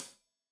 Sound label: Hat Tight, Hi-Hat, Cymbals; Deeply Sampled Drums